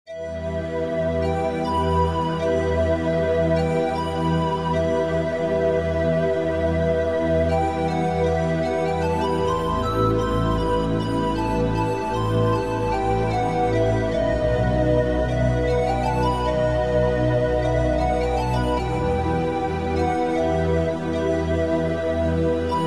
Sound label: Largo From Concerto For Flute